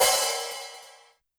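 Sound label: Hi-Hat Open Brush; YAMAHA RM1X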